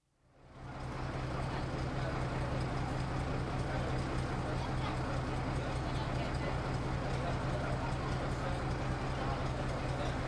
Pioneer railway, external, tickover with chat from children and hisses from train; train departs 1'08; Train Station; BBC